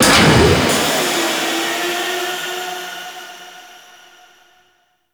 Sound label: Sabotage Power Cell Activate; Space Battles